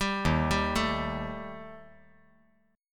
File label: Eb Major Flat 5th Eb